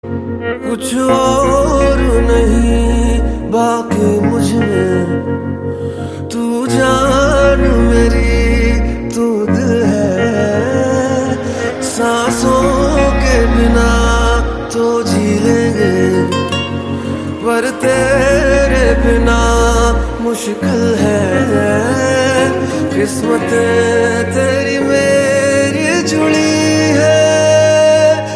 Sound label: romantic love song tone ringtone, bollywood ringtones